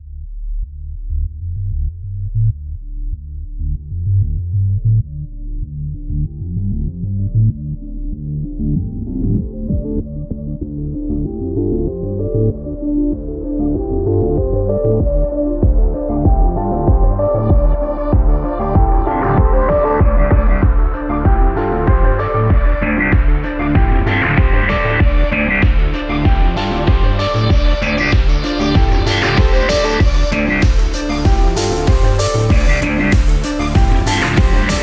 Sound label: Funk